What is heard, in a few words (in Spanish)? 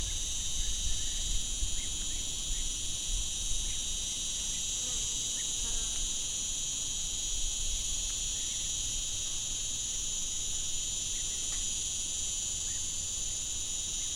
Chicharras